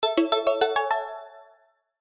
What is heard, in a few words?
success